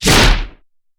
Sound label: Blow